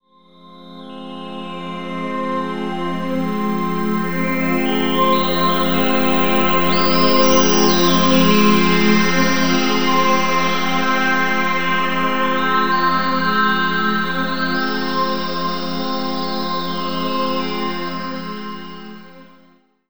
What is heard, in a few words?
Formant Sweep C, Atmospheres; Zero-G Ambiosis